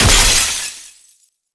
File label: win Explode Large; netent